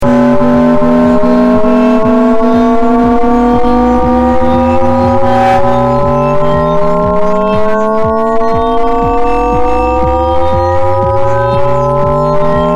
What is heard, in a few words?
loop